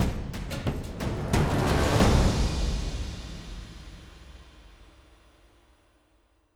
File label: Combat General Outro; Toussaint; General Hub